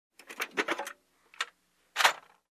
Opening a Tool Box

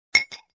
Drinking Glass Clink

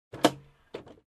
Aircraft Cabin Trash Bin

